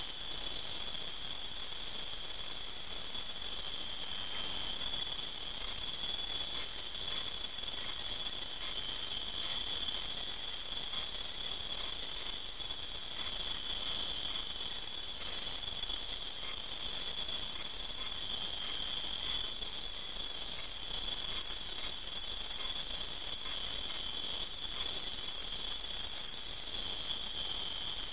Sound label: crick